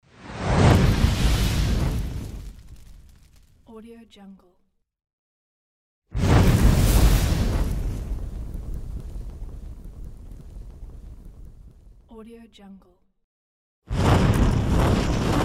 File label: fireball transitions